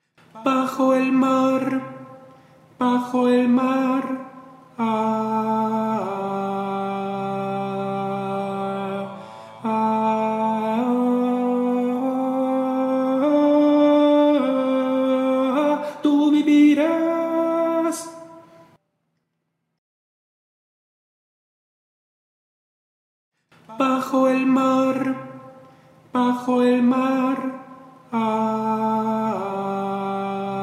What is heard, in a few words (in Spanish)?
sirenita tenor; LA SIRENITA